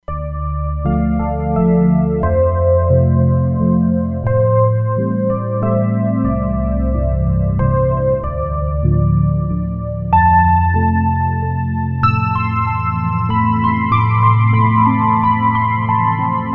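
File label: FM Electric Piano Pad, Roland Fantom XR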